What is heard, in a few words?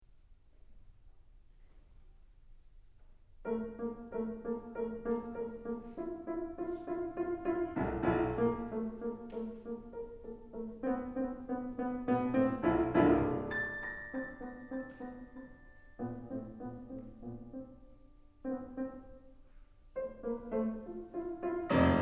piano; II. Scherzo